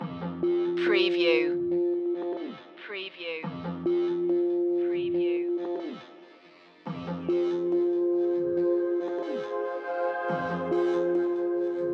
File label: electric life forms no drums or sound fx